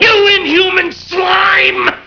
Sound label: slime